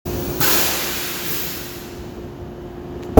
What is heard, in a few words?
break